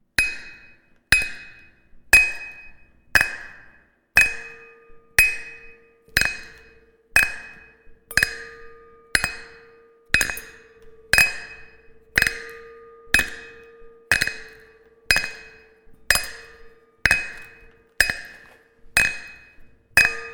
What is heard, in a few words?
wine glasses